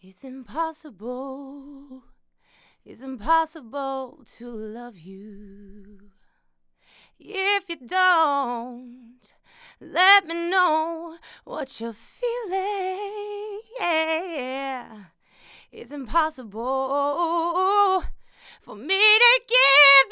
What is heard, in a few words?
Vox, U67; MJE-K47H